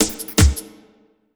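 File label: loop snare drum, 160 BPM, Drum & Bass; Ueberschall; Drum & Bass Resonance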